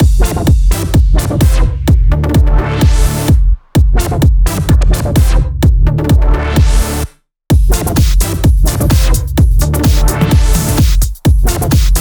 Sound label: Mix, 128BPM; Freaked Kit